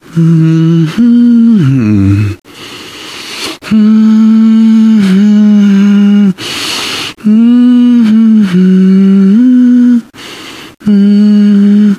nervous humming, survivor voice, manager

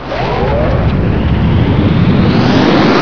tele open short; Source Engine; bshift

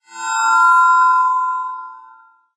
amb random tech; source engine; portal2

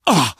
hurt minor, survivor voice, manager

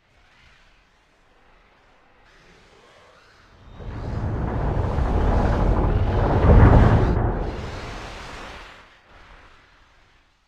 beginning ambience; ambient; outro; source engine; episodic

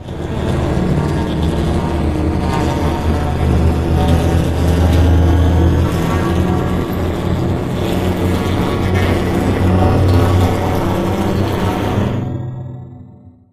slow crusher open travel; Source Engine; Portal 2

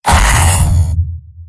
pain sharp; soldier; mvm

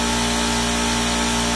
light hum; Source Engine; tf

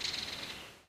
forest bird, ambient; Source Engine; tf

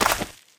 cleats dirt, player footsteps

